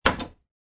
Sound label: switch; cellar